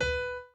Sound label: piano chord